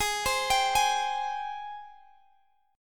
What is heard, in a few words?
G sharp Major 7th, chord; arpeggiated; x,23,22,24,21,x; G#M7